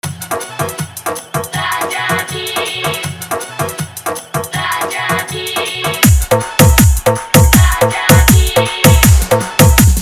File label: Full Dhollki Bass Dance Mix